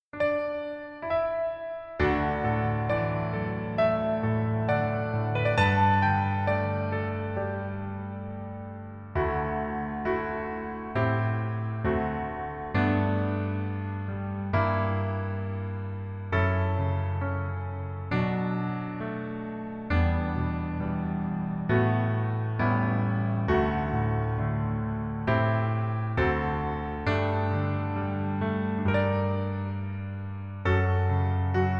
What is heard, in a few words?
Piano ver